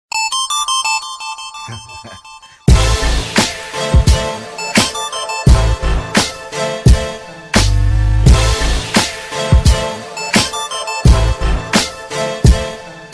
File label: new hip hop